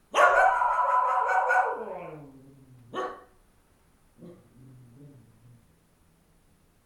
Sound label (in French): Amb Village Nuit Chien; french